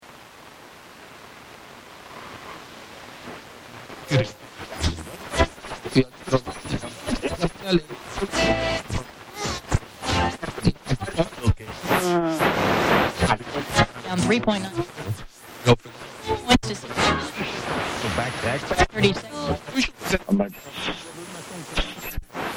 Sony Brick Radio Am Tuning, Radio tuning channels, various broadcast; various broadcasts dropping in between bursts noise; Noise Sound Effects; LynneMusic